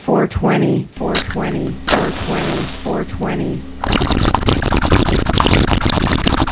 alert; quakerepo fortress misc

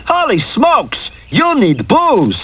booze; quakerepo fortress misc

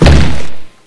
crunch; quake; fortress

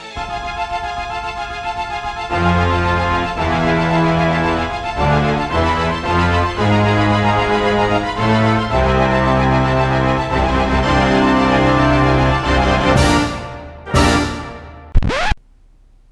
hell music; quake; fortress; gnarler

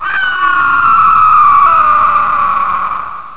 eagle; quakerepo fortress misc

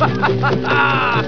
haha; quakerepo fortress misc

